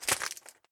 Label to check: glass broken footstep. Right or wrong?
Right